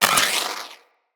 Wall Hand Slide; Footsteps; Wall Run; Characters; Tenno

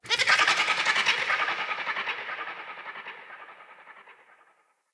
Night Creature Large Bird Medium; Night One Offs; Ambience

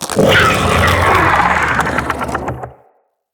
Spider Vocal Attack; Vocals; Root Spider Mother

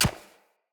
Short Sword Medium Impact Textile; Weapon Impacts; Player; Swords; Environment

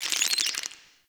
Insect; Liset Alchemy Lab

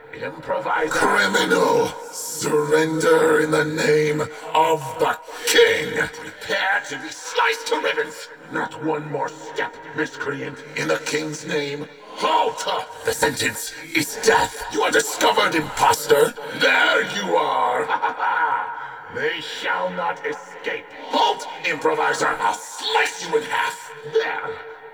Searching Walla Town Street Loop; Warframe; Duviri; Dax

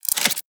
Pistol Reload A; Tenno; Sybaris

